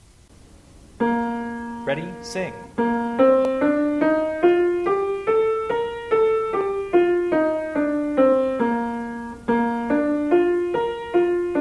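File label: Bb Major, scales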